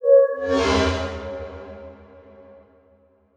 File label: Transition Metallic Hit To Whoosh; Production Element; Title Transition; AdobeCC